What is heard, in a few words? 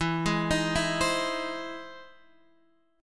E Augmented Major 7th E; E+M7 Chord {X 7 6 8 5 8} arpeggiated